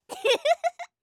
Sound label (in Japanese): 攻撃